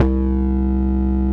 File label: BASS, Drum & Bass, 160 BPM; Ueberschall; Drum & Bass Flash Trackz